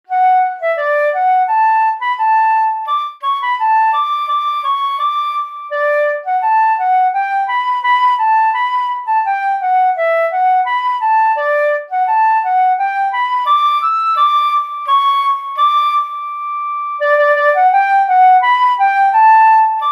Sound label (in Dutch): vivat fluit